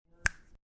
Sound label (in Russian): zvuk shelchka palcami